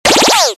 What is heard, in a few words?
Laser